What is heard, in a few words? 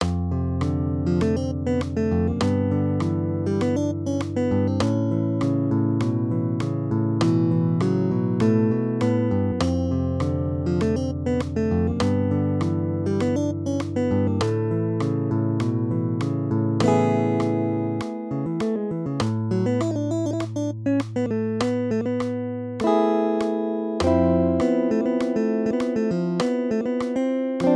duet, guitar; 4/4